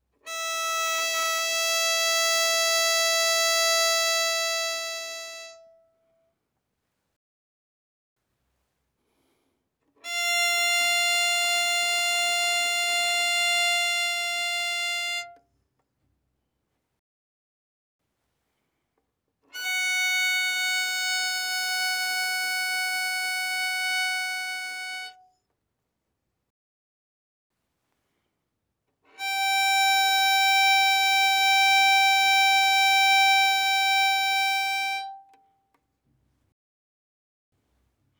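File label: Violin arco fortissimo on E string E5 B5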